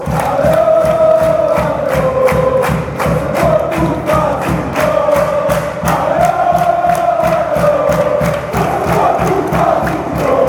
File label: allez oh score fanchants